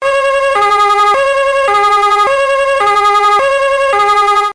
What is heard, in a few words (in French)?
sonnerie pompier